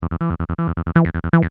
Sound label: loop